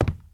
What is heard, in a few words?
wood walk; blocks; step; Minecraft; Remastered